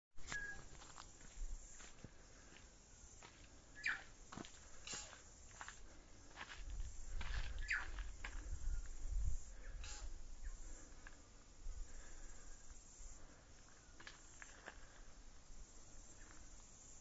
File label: ridge walkway putangirua pinnacles